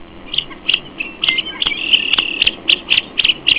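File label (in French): sarcelle à ailes bleues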